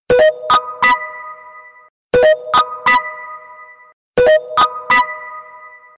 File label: Reminder Tone